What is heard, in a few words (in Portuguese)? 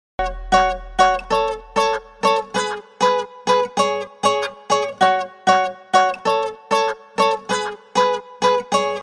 toque do cavaquinho